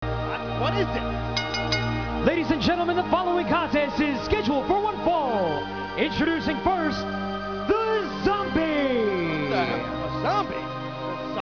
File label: zombie intro